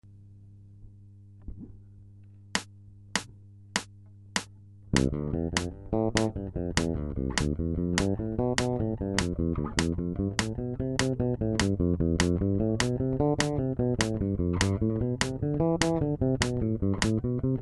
triolets de croches

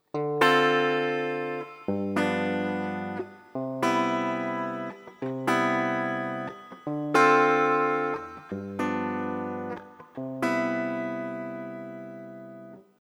251 en C majeur, Harmonisation

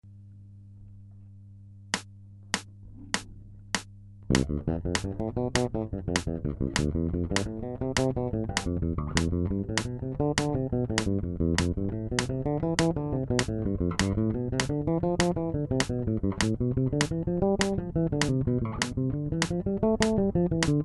septolets de croches

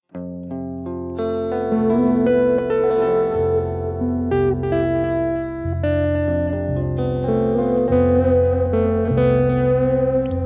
Mode IV Lydien

